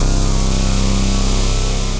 Random Noise Bass, Brooding Bass; Instruments; Ableton; Mood Reel

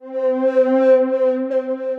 Pathos Pad, Synth Pad; Vogorno; Ableton; Glitch and Wash

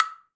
Wood Block Mid; Latin Percussion; Drum Hits; Ableton; Factory Packs

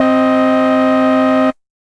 Saw, Synth Lead; Twenty Spartan; Ableton; Glitch and Wash

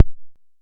analog quick, kick; Ableton Foundation FreePacks

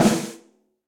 Snare Press Direct Natural; Snare Articulation; Drum Hits; Session Drums Studio; Standard Hits

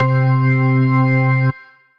Organ Chimes, Tonewheel Organ; Electric Keyboards; Chimes & Dimes; Ableton; Factory Packs

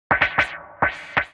FX 170 bpm; Ableton; Factory Packs; Skitter and Step; Hit It

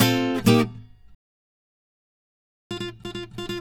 133 Pop Acoustic Guitar F; Pop Rock

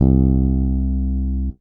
Electric Bass Open; Guitar and Bass; Ableton; Factory Packs

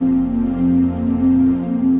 Aroura Pad; Synth Pad; Ableton; Factory Packs; Synth Essentials

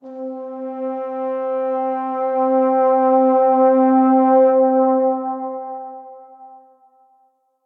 MPE Vibrato Slide & Pressure, Brass Quartet; Ableton; Factory Packs; Spitfire Audio

